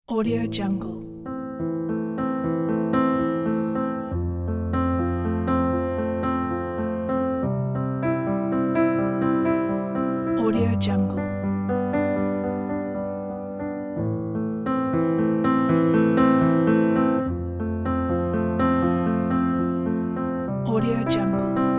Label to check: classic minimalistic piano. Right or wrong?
Right